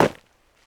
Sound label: dirt land; firefight